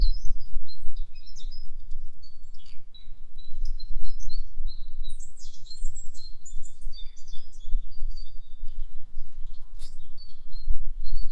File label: english cottage garden, cottage